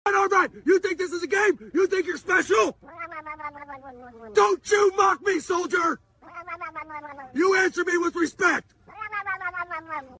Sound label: wah wah wah wah; talking ben